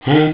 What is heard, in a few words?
"ha"; jazz jackrabbit 2; M8